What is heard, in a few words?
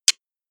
Muted Tap